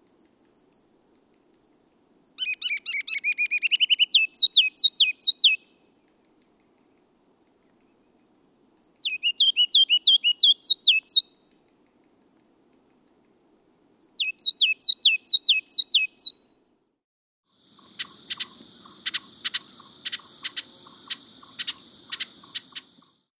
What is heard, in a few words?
Ruby-crowned Kinglet